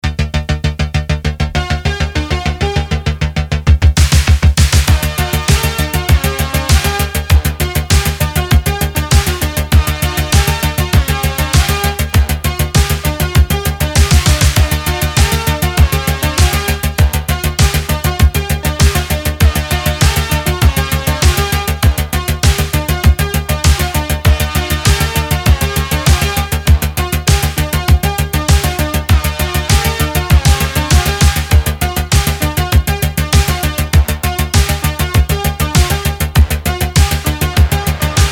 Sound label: Instrumental